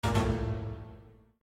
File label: discard broadcast